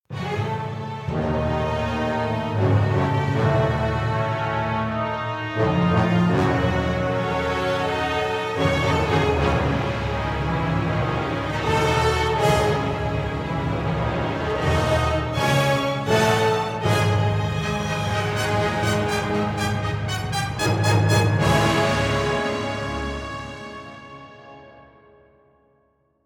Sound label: outro stinger